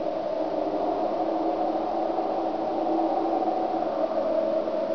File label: wind; cstrike; deathrun terrorlabs